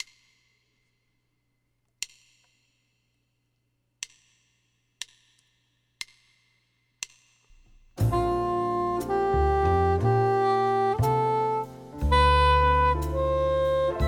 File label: Bb Soprano; First Steps